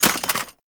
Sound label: Reload, Weapons; Warframe; Braton Remaster